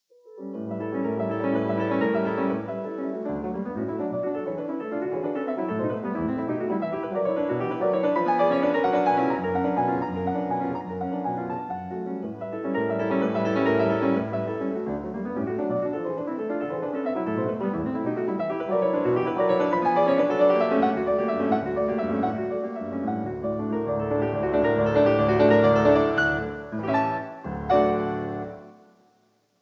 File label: Prelude No. 5 in D major; F. Chopin; 24 Preludes, Op 28